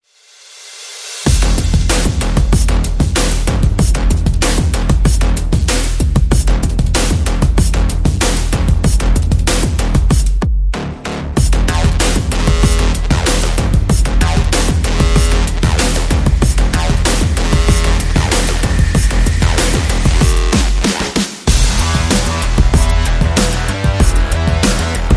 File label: light percussion